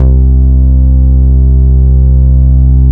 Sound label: Beep